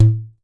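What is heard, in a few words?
KAE KEEKE, HAWAII PERC; AKAI S6000 CD-ROM; WorldSounds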